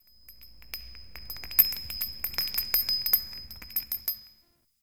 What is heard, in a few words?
Witch Station Chime Jingle; Ambience; Soul Plane